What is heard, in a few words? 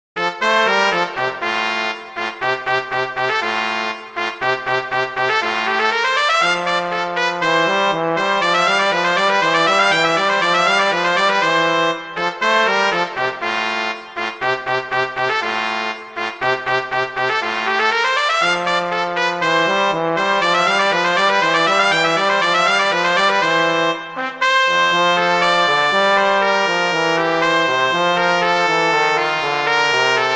Duet; Mozart; Duets